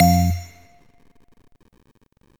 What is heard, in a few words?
Train